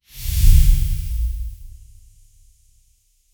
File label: short fantasy impact soun; air burst